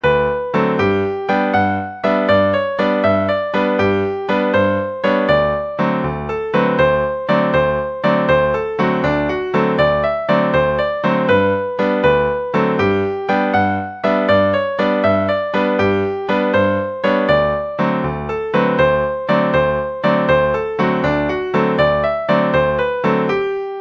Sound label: fiddle hill jig